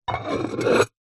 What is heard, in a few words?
household pot ceramic base lid plate scrape